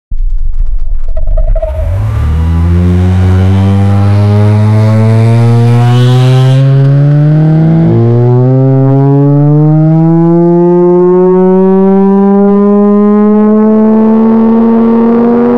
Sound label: a cartoon style car engin, accelerating